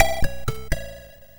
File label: sid MOS6581R2